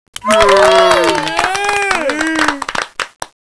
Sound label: cheer